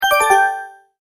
Multimedia Tone-Return/Back; Sound Design; McKinney Sound

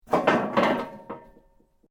metal rods move

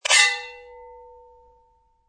Two swords scrape together and their metal sings; Combat

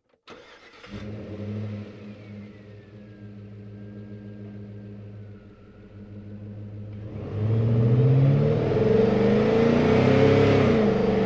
Truck GMC Savana Onboard Drive Accel Decel Fast; Trucks; Big Room Sound

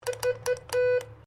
MORSE CODE V; Morse code aplphabete; Morse Code (Sound Effects:Electronics)

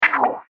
Laser Wah Wah Gun, science fiction sound effect

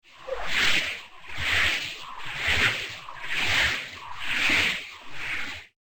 Slow Ski Ice And Snow; Skiing And Snowboarding Sound Effects

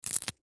Price Tag Rip Off; Price Tag Rip Off Product; Ripping Sound Effects; Foley; Blastwave

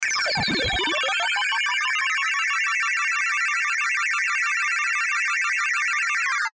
Sci Fi Computing Digital Bleeps; Computer; Blastwave

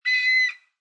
Bird Chirp Squak, Single bird peep, chirp squawk; Birds; Animals; Lynne Music

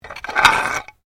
Bones Human Move; Material components for constructing more complex sounds; Tapping (Sound Effects:Objects)

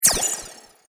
Glassy Laser Zap, laser gun sound effect; science fiction sound effect; star wars / trek style games

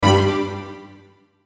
Hit Strings Stab; Sound Design; Blastwave

